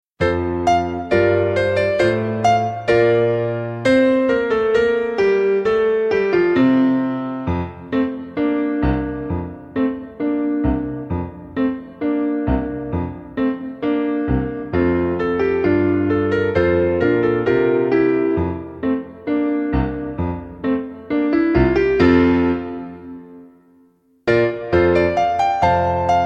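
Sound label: with Accompaniment, quarter note=132; Play-a-long; March Majestic; trumpet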